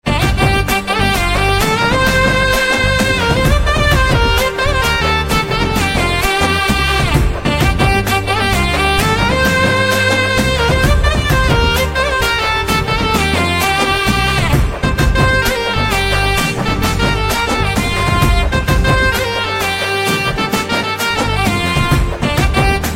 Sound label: Wedding Dance BGM Saxophone Instrumental Ringtone